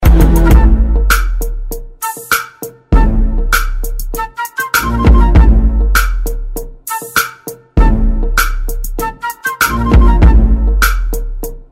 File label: hip hop beats